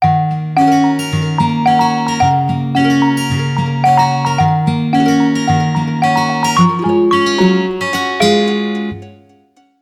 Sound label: Oppo A94 5G Ringtone; Device : Oppo; Model : Oppo A94 5G